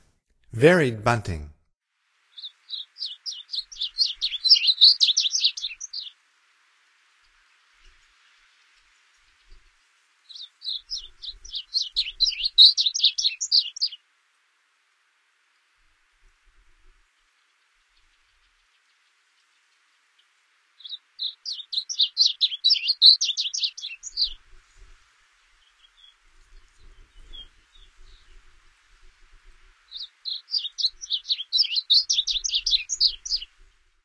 Varied Bunting, Songs of Birds of North America; Stokes

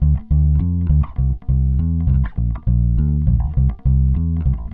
Jazz bass, Rock Bass

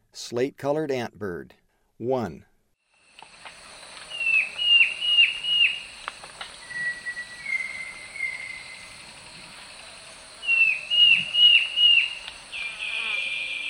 Slate-colored Antbird; S. America; Eastern Lowlands

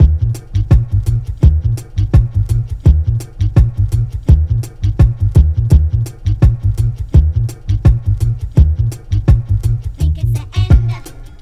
Low Slung, Hip-Hop, 84

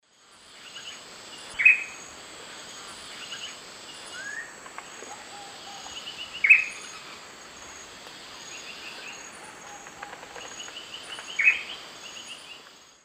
Formicarius analis, call; S. America; Eastern Lowlands

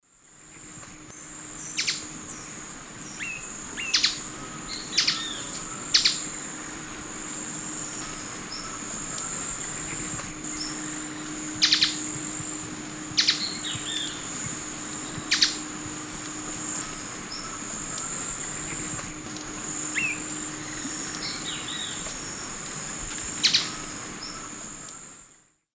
Cranioleuca vulpecula, call; S. America; Eastern Lowlands

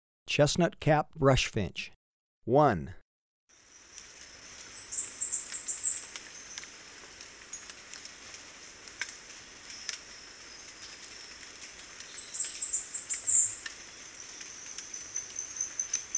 Chestnut-Capped Brush-Finch, Birds of Ecuador; S. America

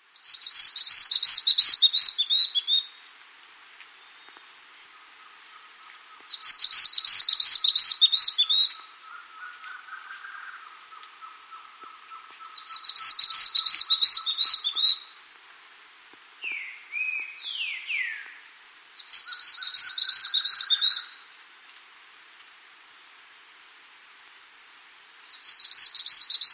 White-Bibbed Antbird, S. America

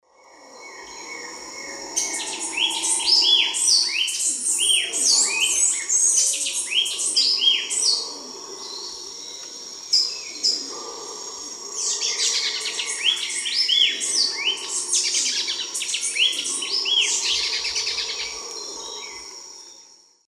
Eucometis penicillata, song; S. America; Eastern Lowlands

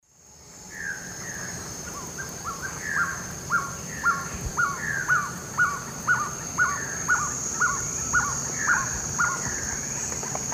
Trogon violaceus, duet; S. America; Eastern Lowlands

